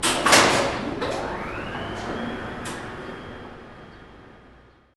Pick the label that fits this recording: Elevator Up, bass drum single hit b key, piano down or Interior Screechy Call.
Elevator Up